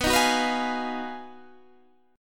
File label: Ab Minor 13th, B, guitar chord